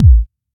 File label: bassy kick one shot a key; kick drum samples